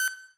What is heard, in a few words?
beep